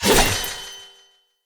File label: Saw Dagger Throw Perfect; Weapons; Player; Swords